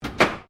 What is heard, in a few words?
PINBALL MODERN PLUNGER, Modern pinball table; Arcades